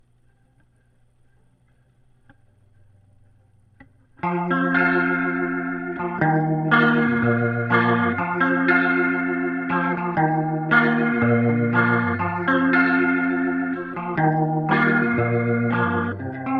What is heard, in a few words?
Leslie gtr